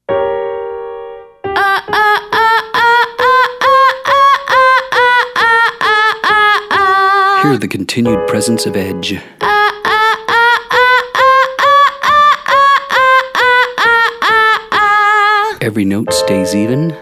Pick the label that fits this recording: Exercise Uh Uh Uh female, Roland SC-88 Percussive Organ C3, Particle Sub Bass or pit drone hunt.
Exercise Uh Uh Uh female